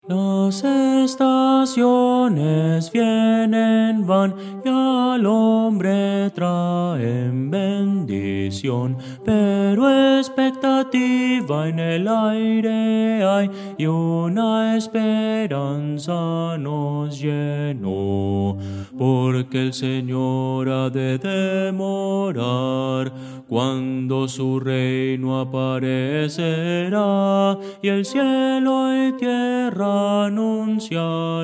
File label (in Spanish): bajo